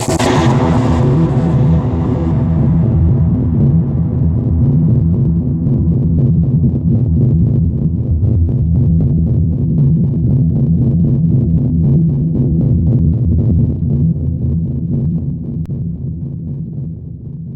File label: Atmosphere E 142 BPM, Psytrance; Lumos Prima; Ghosthack; Psytrance Visions Volume 2